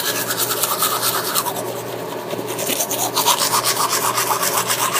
brushing Teeth

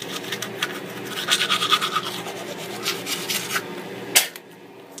brushing Teeth

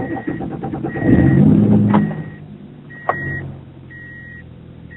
turning Car On

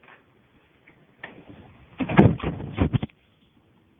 closing Door

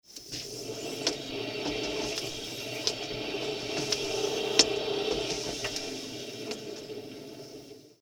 revolving

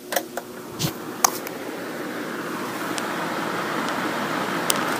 turning On Fan

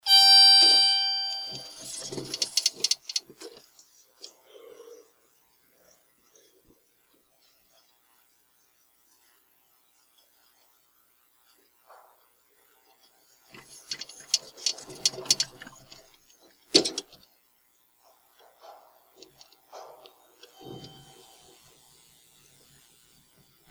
elevator

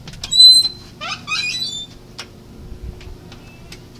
closin Door

